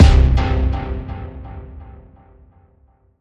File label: One Shot Stomp Tonal